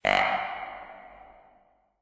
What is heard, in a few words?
grunt buck